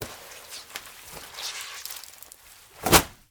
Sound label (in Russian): zvuki vafelnogo polotentsa